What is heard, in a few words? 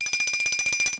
Bell Ring; Ed Edd n Eddy; Adromulus Pack; EEnE